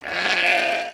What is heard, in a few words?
Sheep Scared; creatures organic